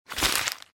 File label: trash plastic